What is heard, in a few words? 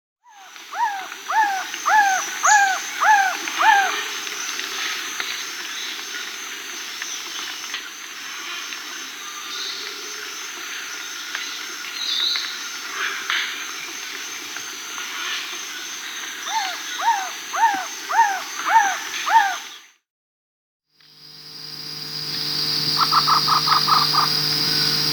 Black-tailed Trogon (Trogon melanurus), Peru; S. America